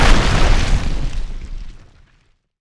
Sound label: grow; tiny